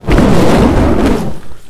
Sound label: fire staff whooshes; soundmorph